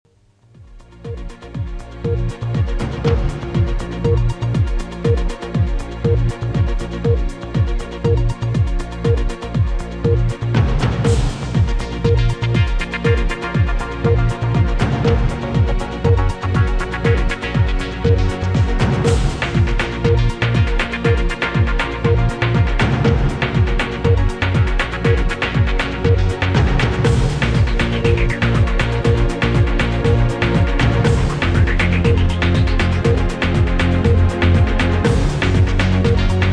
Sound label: News Countdown; BBC News 24 2003